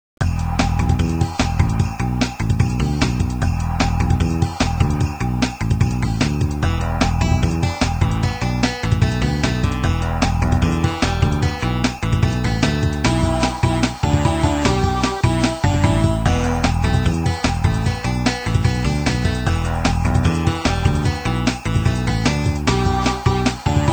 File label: music; deathrun crash2; csnow